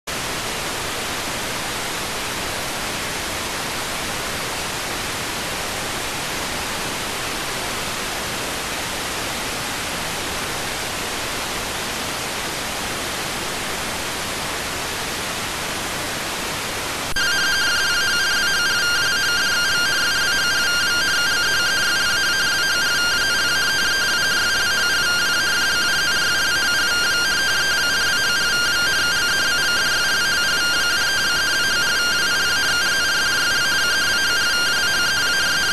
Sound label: rtty rx